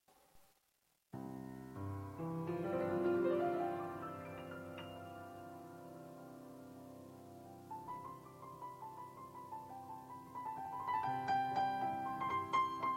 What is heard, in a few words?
piano